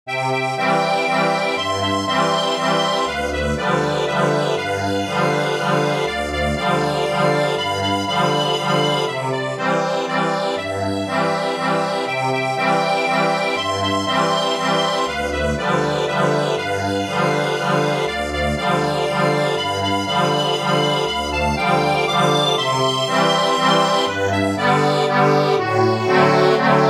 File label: ACCORDION